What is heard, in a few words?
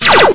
bang; bubble shooter